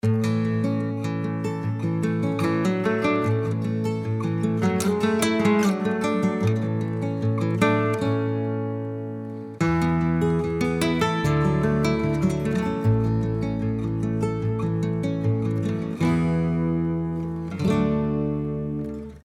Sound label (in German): Klingelton akustik; Montafon; Android